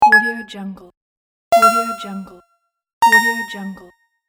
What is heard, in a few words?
button confirm